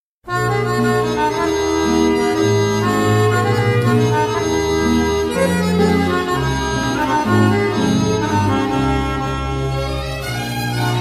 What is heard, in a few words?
Horo; Bulgarie